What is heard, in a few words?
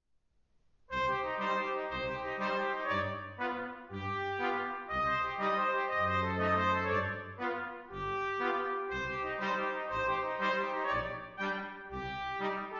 Clog Dance Brass Quartet; recording made using the Wallander NotePerformer plug-in for Sibelius